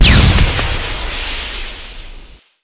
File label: thermite grenade; SpecOps2